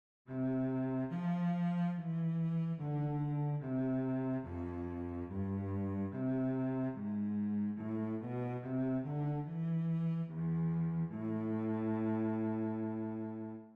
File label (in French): VIOLONCELLE